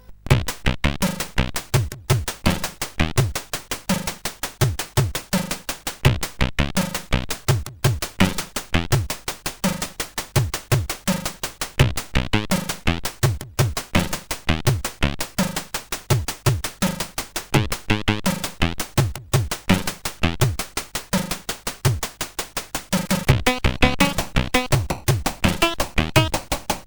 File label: Happy Birthday sid CSG8580R5